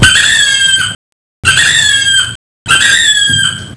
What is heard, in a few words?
High Pitched Rooster